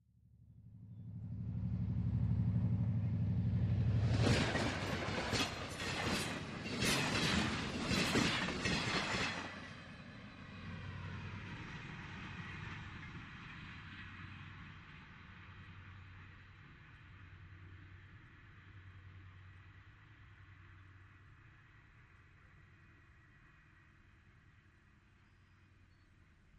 Train By Very Fast, bys

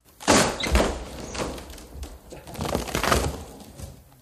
Tree Fall Snap Fall; trees

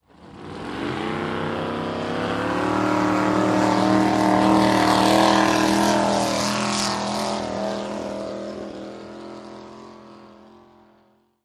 Drag Boat Start by

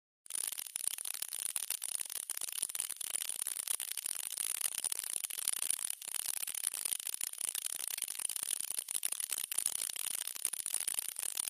Noise Elect Clicking

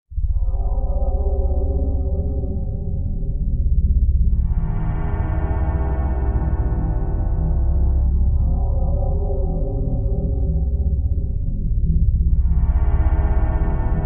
Music Bed Eerie Ambi

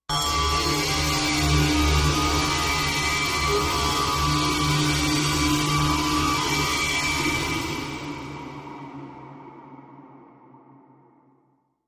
Servo Machine Screech; laser

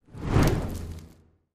Fireball

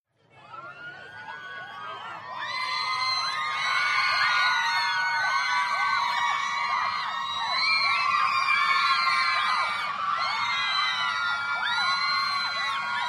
Crowd Teenage Girls, medium

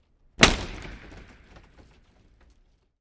Hockey Hit Heavy

